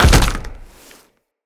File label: land heavy ice, footsteps; Characters; Tenno